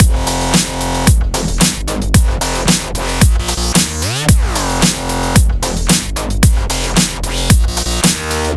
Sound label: 112 F- Music Loops